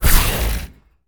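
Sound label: elec spark laser, attack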